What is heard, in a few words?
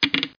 roll